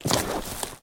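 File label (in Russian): бумажный шелест пустых коробок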